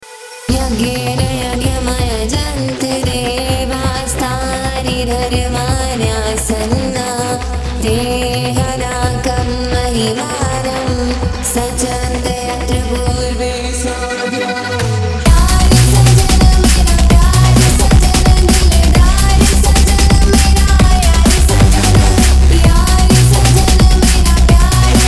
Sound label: middle eastern, phonk